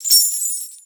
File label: BELLS, percussion; Session Instruments; Best Service ProSamples vol.42